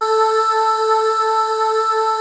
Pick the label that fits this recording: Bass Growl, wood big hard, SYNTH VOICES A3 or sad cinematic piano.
SYNTH VOICES A3